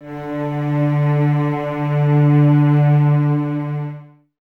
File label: cellos D 3, String Ensembles; Miroslav Vitous